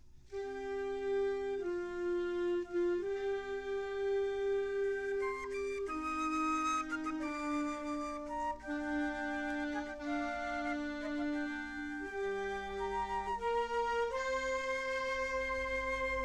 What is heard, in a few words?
flute solo; band